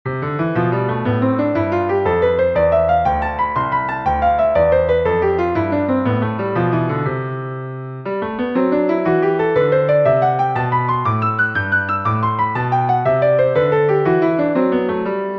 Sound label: Major Scales 3-Octave Triplets; Scales3: Major Scales 3-Octave Triplets 1